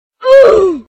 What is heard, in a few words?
OOF loud